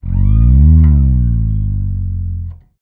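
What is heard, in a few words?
6-string fretless bass; Spectrasonics; Bass Legends; 6-STR FRETLS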